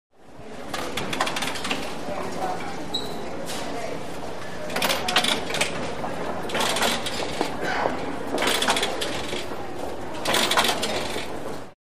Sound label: Close Turnstile Ratc, train station